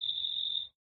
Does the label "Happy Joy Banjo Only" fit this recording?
No